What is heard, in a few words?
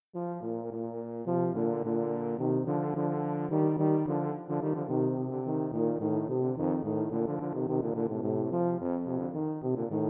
duet, 2 tubas; molter